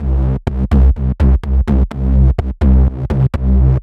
Vent; Бас сэмпл (Минимал-Электро); Minimal Electro; 125 BPM; Bass

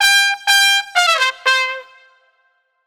Riff, brass

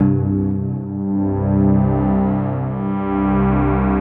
pad

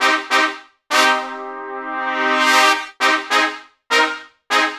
Trumpets; лупы; 70’s Funk

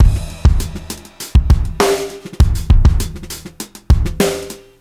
Grooove, рок

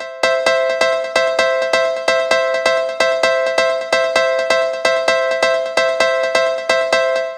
Dancer Piano; Звук клавишных; 130 BPM; Electric Jazz; loop; Электро Джаз; Keys

